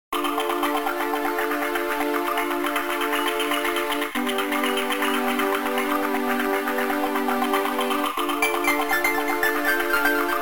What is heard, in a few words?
OST Waterfall; DKC3; GBA